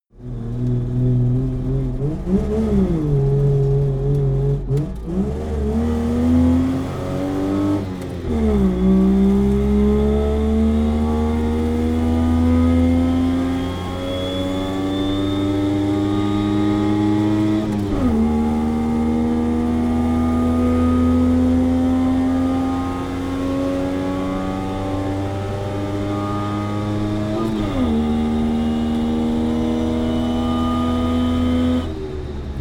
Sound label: car saab 92 accelerate heavy interior